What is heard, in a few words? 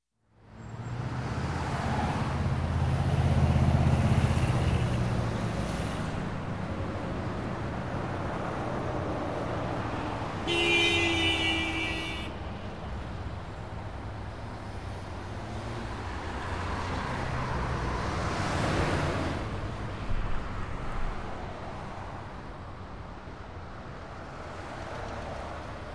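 road noise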